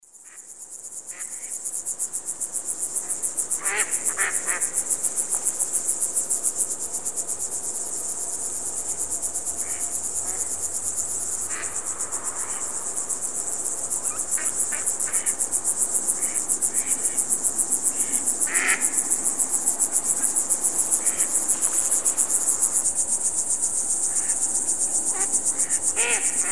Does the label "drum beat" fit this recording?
No